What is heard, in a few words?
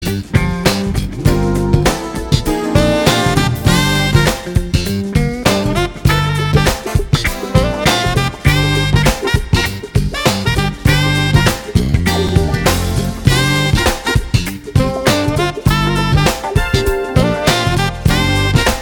funk